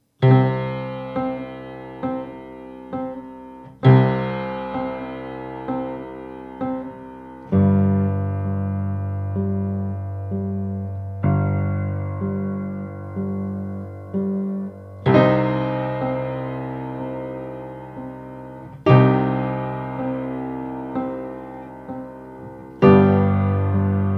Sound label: Emerald Green Bass